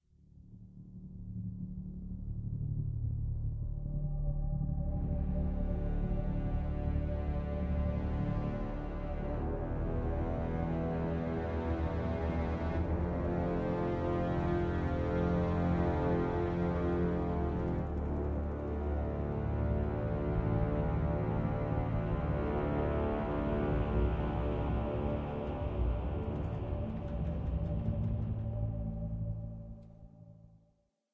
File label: player turn spooky; devils demons android game music